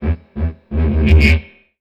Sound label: Damp Start; Zero-G; Total Drum Bass; Hits and Bits